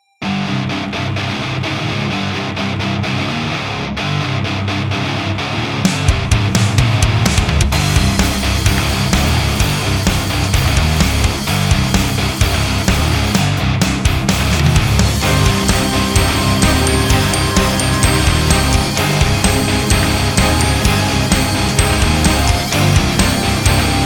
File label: Rock metal